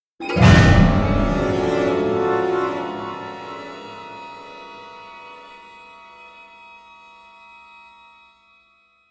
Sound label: Stinger Drama; Dishonored